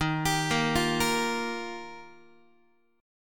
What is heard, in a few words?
D sharp Major Add 9th, Guitar; arpeggiated; D#add9; x,11,10,8,9,9; JGuitar